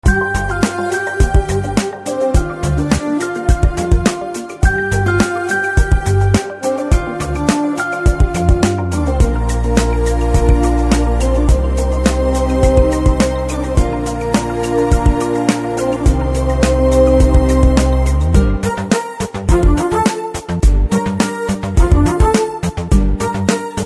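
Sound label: background music Telugu Ringtone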